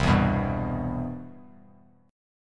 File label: C Major 7th, Bass Standard; CM7 strummed; JGuitar